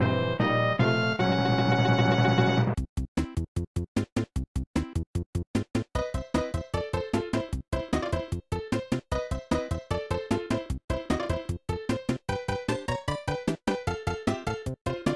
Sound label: Enemy Battle; SMAS; SMB3